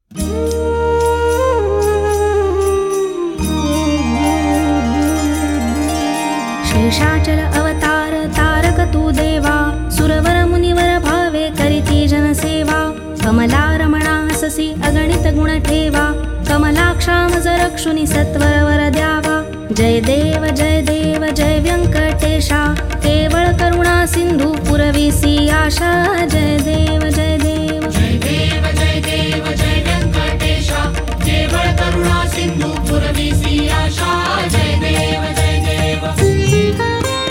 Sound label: venkatesh aarti marathi